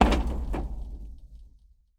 bridge, stomp, xlarge, arched, wood, heavy iron, stream, urban park, strong, clatter, stagger; Eiravaein Sound; Gravitas